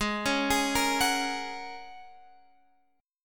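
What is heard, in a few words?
A flat 7th Suspended 2nd Suspended 4th A flat Guitar Open F, chord; arpeggiated; x,15,13,15,13,13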